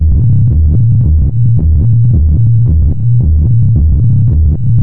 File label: gunship engine loop; npc; Garry's Mod; hl2; combine gunship